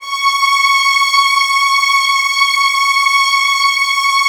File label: C#3, Strings; ILIO; Kirk Hunter Virtuoso Strings VOL-3; STR TAME